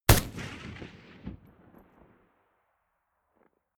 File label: rifle ak-47 exterior fire single; comedy-cartoon; Pistols and guns; pistolen-gewehre